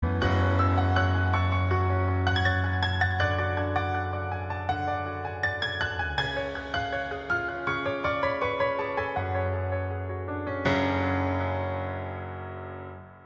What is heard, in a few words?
purple instrumental ringtone